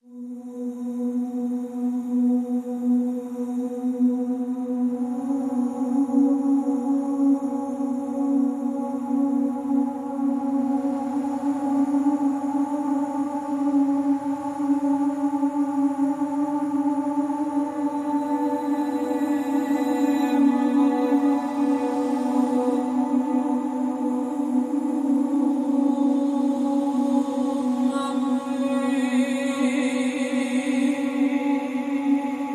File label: The Siren, No Drums